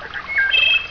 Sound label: bird